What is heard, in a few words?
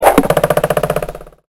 arrow